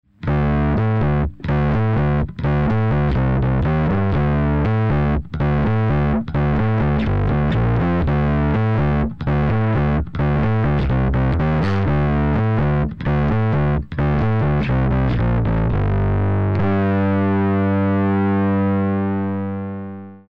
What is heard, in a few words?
Overdrive